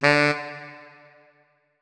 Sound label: notes, saxophone; Hideout Furniture